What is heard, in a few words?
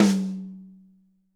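high tom; 90s sample CD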